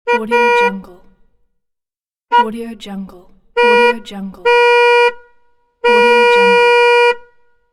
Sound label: car horn